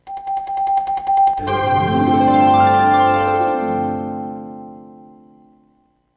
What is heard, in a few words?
Jingle Clear; Magic Sudoku Numpla Oekaki Puzzle; psp